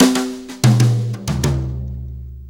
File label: Brushes Fill; Sonic Foundry (Sony Creative Software); Loops & Samples Drum Tools